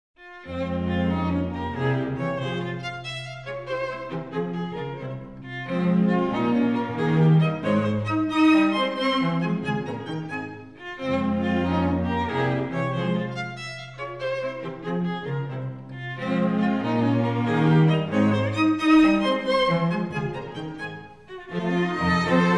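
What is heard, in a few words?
quartet; viotti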